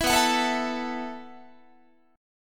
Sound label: B 7th Suspended 4th B down Guitar